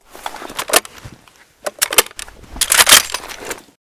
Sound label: reload empty, weapons ash12; STALKER; IWP